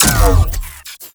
Beam Stop; Warframe; Corpus; Corpus Railjack; Crp Admiral Agent

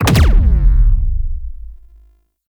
Concrete Archgun Charged Punch; Warframe; Archwing

